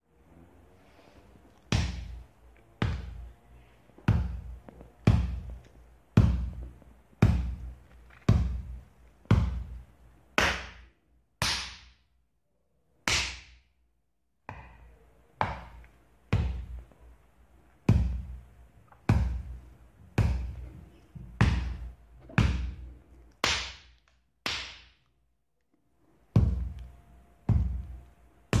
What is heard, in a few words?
wall hit